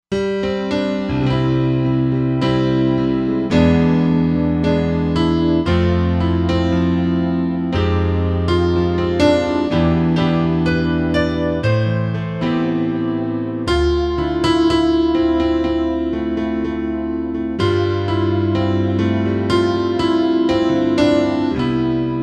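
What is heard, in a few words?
Piano For Ballads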